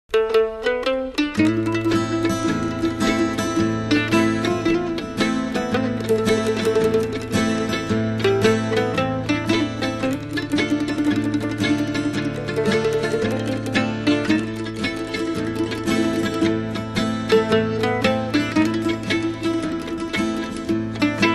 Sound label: old time gospel instrumentals